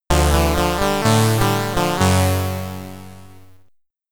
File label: Stage Clear (SID Stereo); Target Renegade; Commodore 64